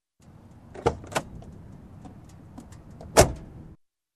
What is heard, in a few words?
Getting into Car